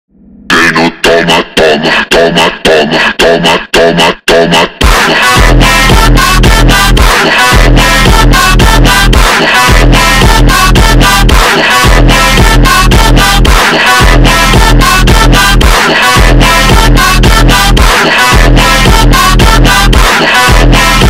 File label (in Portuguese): montagem vozes profundas super slowed